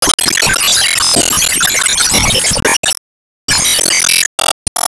Gacha Tap Special Projection

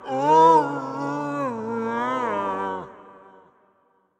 Toxic Mask Death

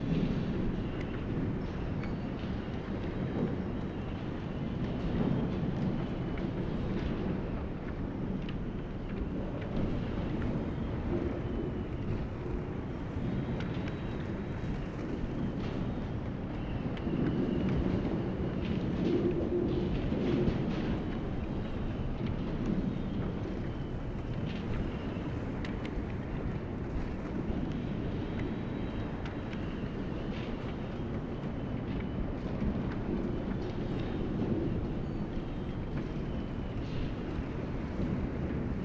log ambient; obderiha log ambient

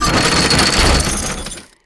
Enemy Gear Active Loop; disney star smash android ios mobile gamerip 2020

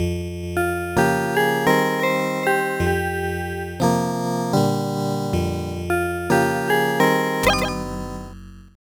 Piano; Night Manor

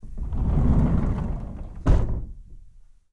Slide Door Close; d4dj groovy mix; android

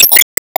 Team Ball Fly Popup

